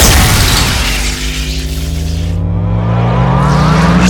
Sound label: death sounds; thunderking